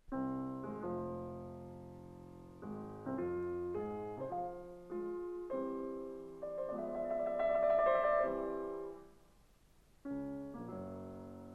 Piano Sonata No. 23 in F minor; Wilhelm Kempff; Beethoven; Piano Sonatas

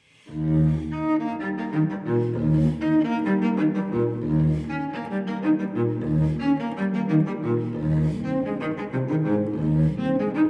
Cello Suite No. 4 in E flat major Praeludium; BWV1010; Johann Sebastian Bach

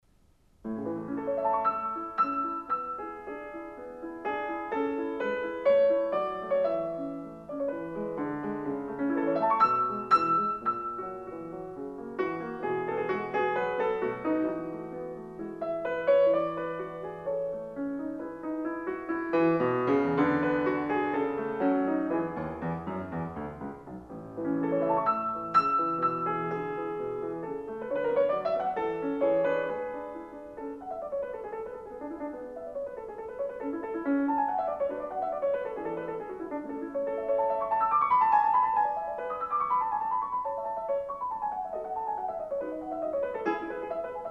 Piano Sonata No. 2 in A major; Wilhelm Kempff; Beethoven; Piano Sonatas

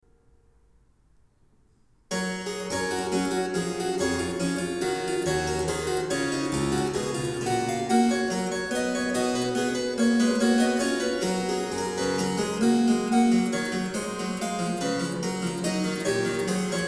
Harpsichord

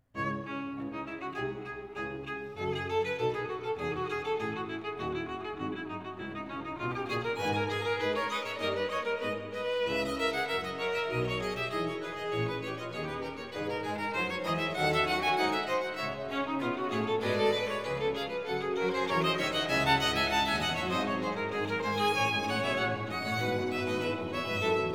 Tenor; Ich will nur dir zu Ehren leben; Bach; Christmas Oratorio BWV 248

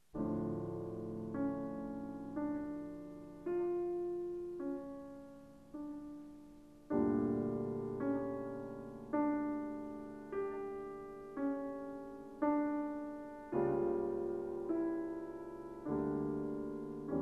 Piano Sonata No. 7 in D major; Wilhelm Kempff; Beethoven; Piano Sonatas

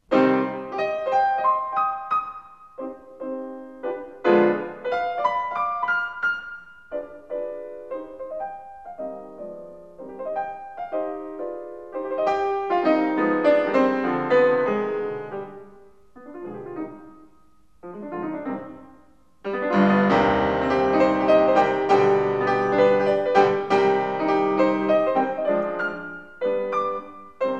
Piano Sonata No. 5 in C minor; Wilhelm Kempff; Beethoven; Piano Sonatas

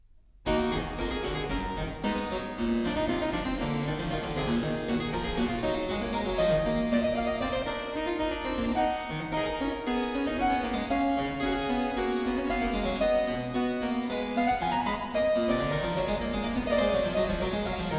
Allegro, Sonatas for Violin and Harpsichord; Sonata No. 6 in G Major, BWV 1019; Bach; J.S. Bach

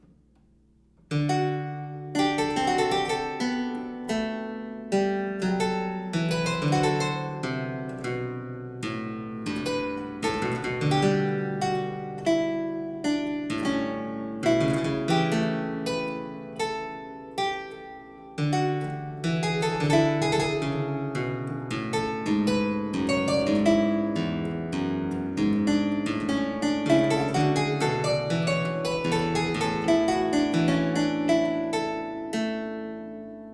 French Suite No. 4 in E flat major, Sarabande; Johann Sebastian Bach

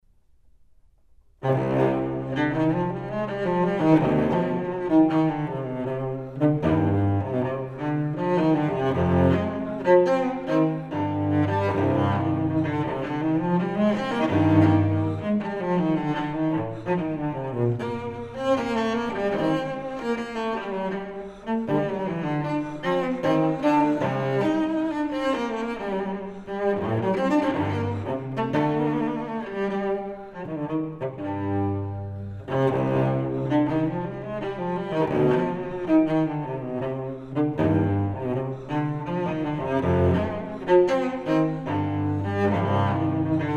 Suite for solo cello No. 5 in C m; Bach; Cello Suites

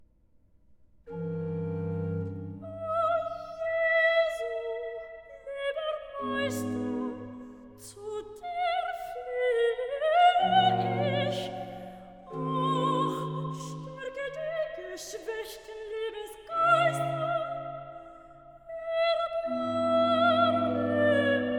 Recitative, Soprano; Es ist nicht Gesundes an meinem Leibe, BWV 25; O Jesu, lieber Meister; Bach; Cantatas, Vol 13

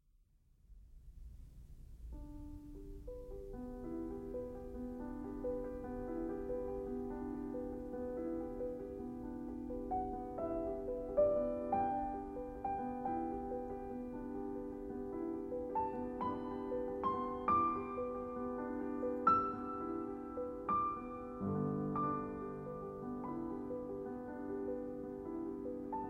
Prelude in G major, piano; 24 Preludes

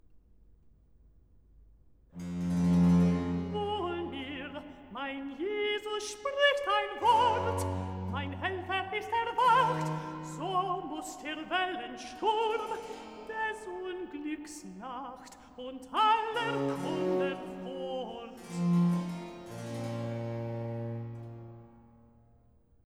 Recitative; Jesus schläft, was soll ich hoffen, BWV 81; Wohl mir, mein Jesus spric; Bach; Robin Blaze; Cantatas, Vol. 21

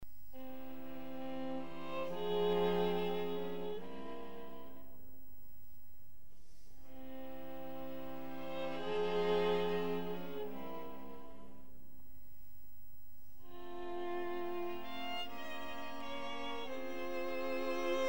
String Quartet in F minor

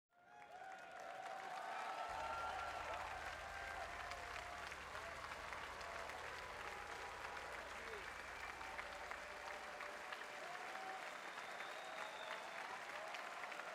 Bellscape And Orchestral; chicago theatre chicago 28 june 2017

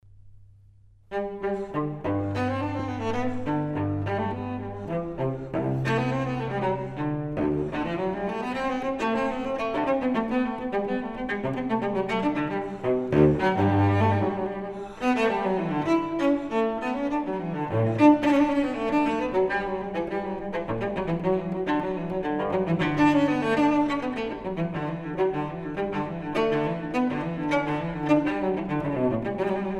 Suite for solo cello No. 1 in G; Bach; Cello Suites

